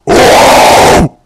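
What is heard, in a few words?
uzhasnij rev zverya, terrible roar of a beast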